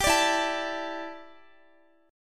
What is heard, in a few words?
E Minor 11th guitar chord; Em11 strummed; JGuitar